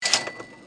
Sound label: SFX Cash Pick Up; Game; Operation Mania Online; windows gamerip 2008